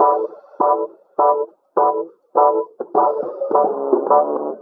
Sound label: keys, loops, phonky